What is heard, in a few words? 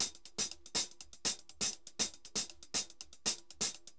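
hi-hat, DRUMS SAMBA; Best Service ProSamples vol.14; AKAI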